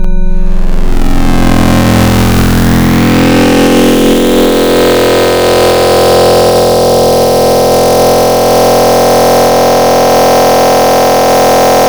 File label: Series Saw Mod Sync, Serum Wavetables; Synthetic's Serum Collection; Cymatics - Nuclear for Xfer Serum Wavetables